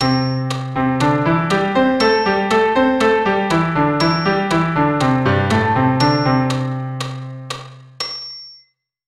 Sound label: C pent, keyboard